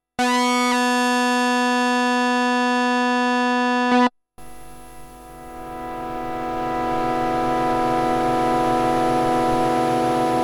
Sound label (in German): DSIP12M Restgeraeusch